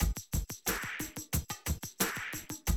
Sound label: Classic DrumLoops